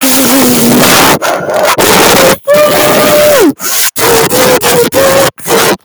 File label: bass boosted